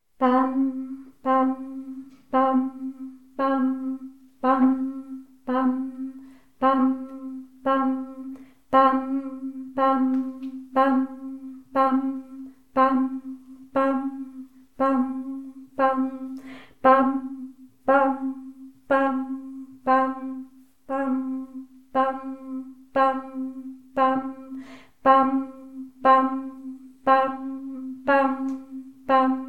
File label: tenor; Farnosť Letanovce